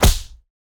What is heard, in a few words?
Dash Slam Impact; boomerang fu